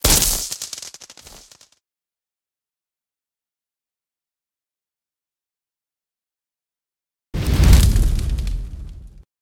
electric shock

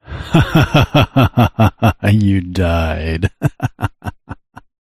you died; turings-tarpit

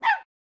clicker bark

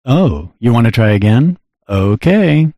building; turings-tarpit

